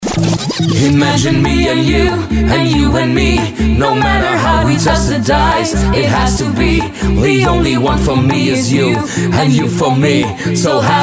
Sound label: BEAT